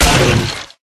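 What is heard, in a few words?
Dragon Skeleton wound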